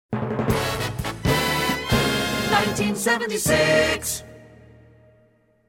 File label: Hits, Drops